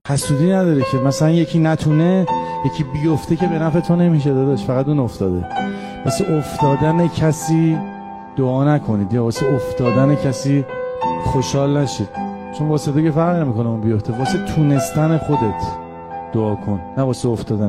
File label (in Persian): music, آهنگ کوتاه غمگین ایرانی